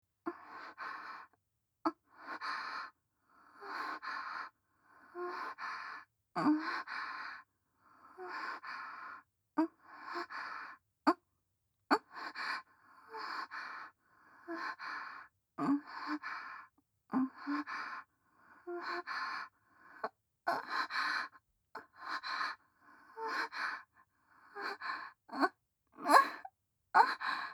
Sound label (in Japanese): 喘ぎ声